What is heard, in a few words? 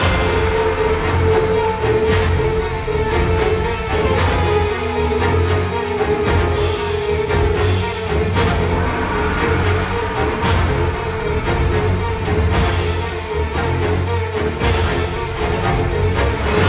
String; Assassin's Creed Unity Dead Kings